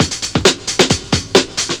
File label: LOOP; SoundFactory; DRUMLOOP